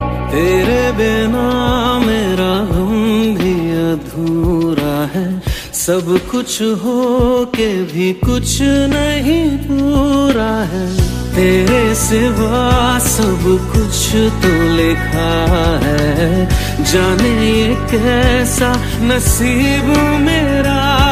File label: sad song ringtone